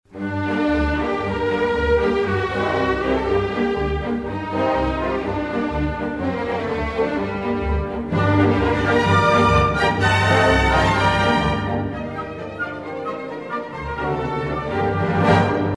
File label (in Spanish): danza hungara